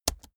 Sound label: keyboard laptop space press slow hard